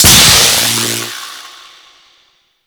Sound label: Arc Trap Explosion; Warframe